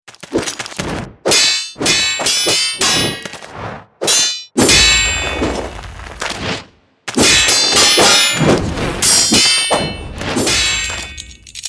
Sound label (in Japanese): 剣撃効果音